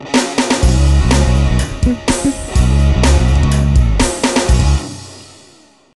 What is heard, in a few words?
Heavy rock; Worms Armageddon